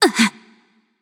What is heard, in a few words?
surprised, voicepack; smite; pele